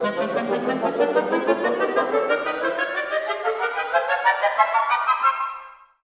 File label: woodwins progression